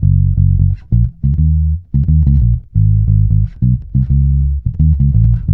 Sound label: F#, dub, bass; Spectrasonics; Bass Legends CD 1; JOY DUB